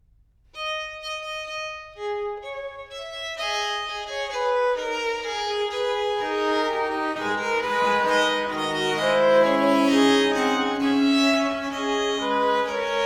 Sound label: Fantasia for strings; Purcell; Complete Chamber Music; Brilliant Classics; Fantasias for strings